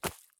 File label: sprint grass low; Escape from Tarkov